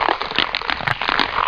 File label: crush; CD Action; outlaw